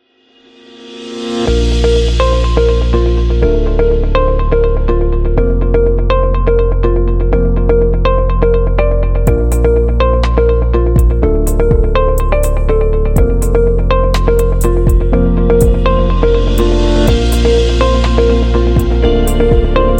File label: Real Estate Music